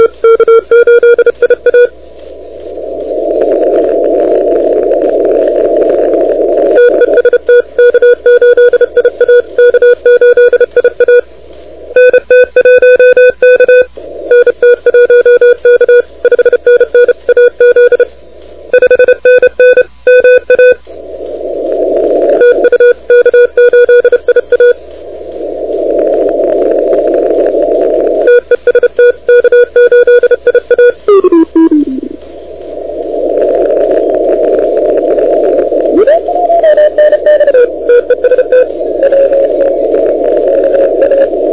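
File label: continuous wave 28MHz; ARRL10M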